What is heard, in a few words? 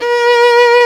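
STRINGS SOLO, Chamber Grp; Roland L-CD702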